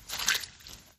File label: step